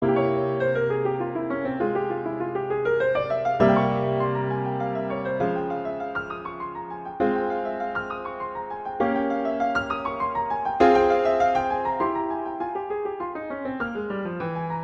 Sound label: Exercise, F minor; Clementi; Preludes